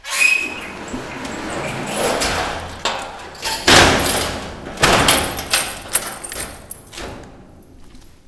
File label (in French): fermeture porte